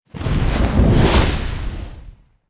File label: fire magic